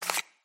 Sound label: card slide